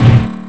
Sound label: impulse; redeclipse